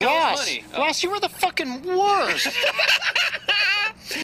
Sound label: laughs